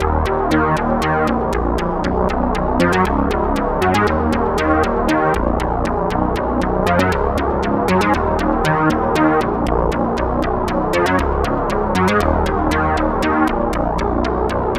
bass synth, 118BPM; bass-synth.wav

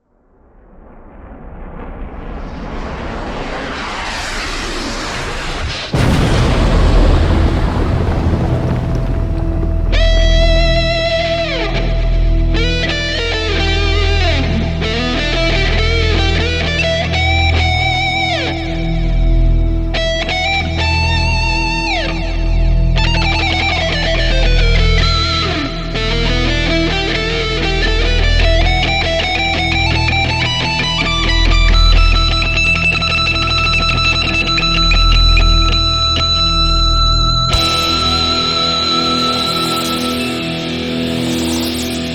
Instrumental; Pony Music Archive

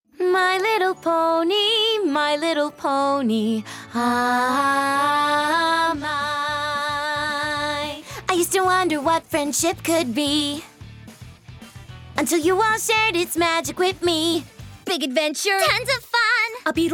(Vocals); My Little Pony OST Repository; Season 1; Bonus Music

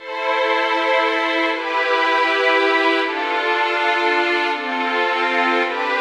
Violins, 160 BPM

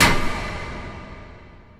Echoy Clamp

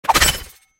attack projectile; Li Li Shadowpaw Sound Effects; Li Li Heroes of the Storm Responses; warcraft

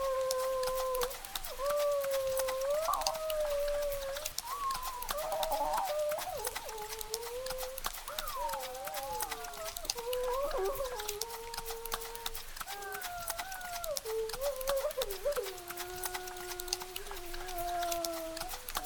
crying; Virtual YouTubers; paizuri (cryzuri)

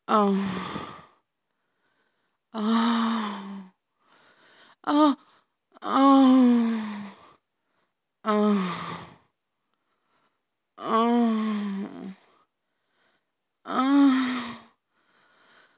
More moaning

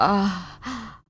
Moan

